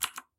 keyboard type; Golden Crayon Sounds

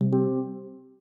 Connected, AirPods Sounds

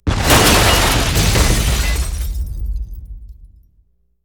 police car die; Original TK Sounds

